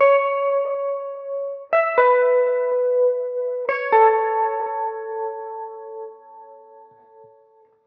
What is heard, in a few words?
Guitar loop 122bpm A wet; guitar vibes